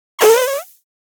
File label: Fart Foley Strain, Thin And Windy; Hollywood Edge; sound designer's toolkit v2